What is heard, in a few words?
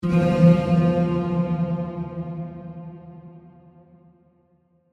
Digital Gong; Production Element; Digital (Sound Effects:Sound Design)